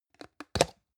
plastic can small lid open medium

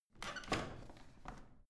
washing machine drawer out squeaky soft

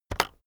keyboard external enter press very fast

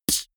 beatbox snare

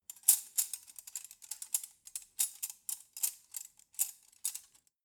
cutlery forks handling long

